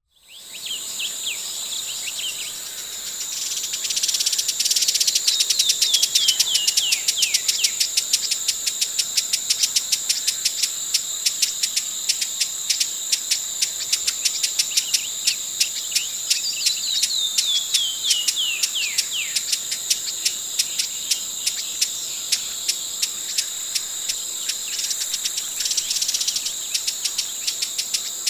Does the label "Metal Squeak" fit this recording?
No